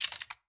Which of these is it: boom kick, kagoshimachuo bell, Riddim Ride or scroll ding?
scroll ding